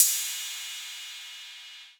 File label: crash sound B key, Royality free crash cymbal sample tuned to the B note.; cymbal drum samples